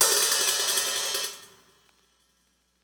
open hh; eddyflux

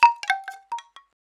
wood session perc; eddyflux

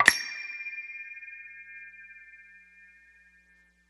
perc; eddyflux

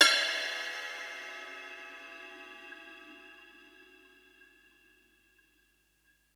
ride; eddyflux

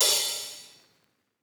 open hh; eddyflux

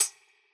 closed hh; eddyflux

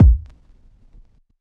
kick; eddyflux; crate bd; dough-samples

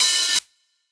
open hh; eddyflux

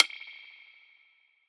stick; eddyflux

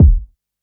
kick; eddyflux; crate bd; dough-samples

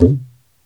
gumki, mrid

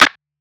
snare; dough-samples; eddyflux

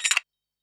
perc; eddyflux

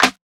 snare; dough-samples; eddyflux

